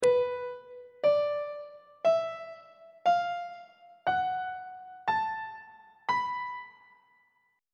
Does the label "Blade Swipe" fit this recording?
No